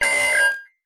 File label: science fiction computer; Audio Mangler